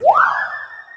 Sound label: start beep